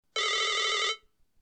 ring bonjour; blackberry bold 9700; ringe-bonjour

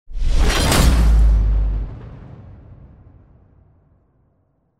impact

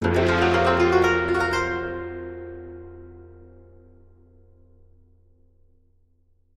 game over

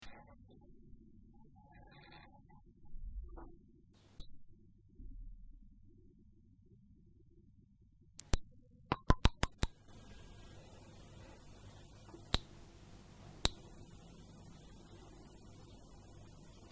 cat

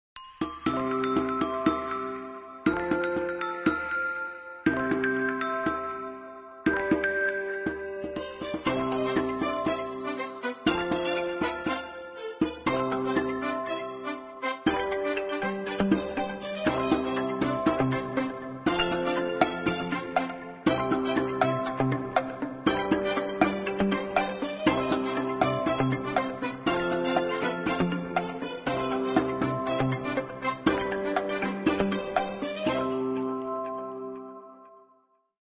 go beyond latin

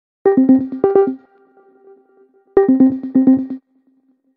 crab, zvuk nothing phone

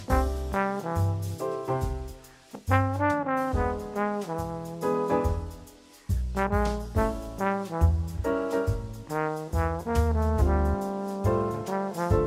trombone

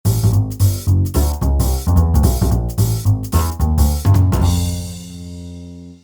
dogbass, undertale

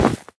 snow golem hurt, minecraft; snezhnogo golema

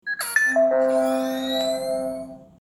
robot

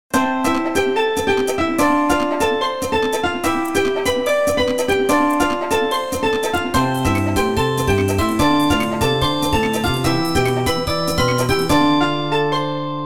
moto flare, motorola razr v3; ringtony motorola razr v3

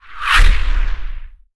epic swishes

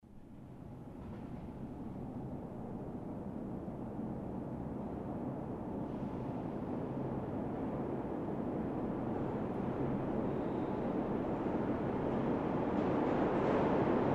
metro

